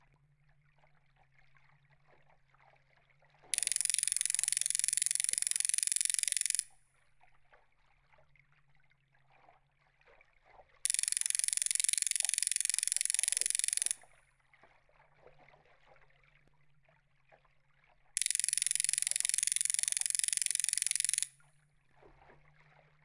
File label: hydro 48kHz stereo